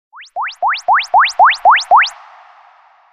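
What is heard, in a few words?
High Quality Sms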